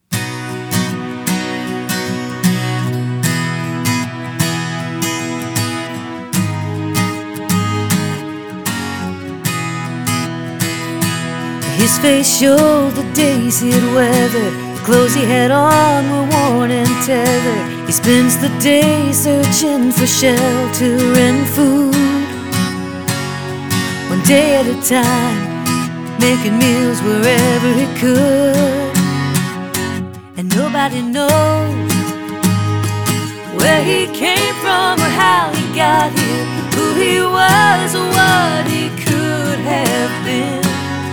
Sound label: vocal; Discount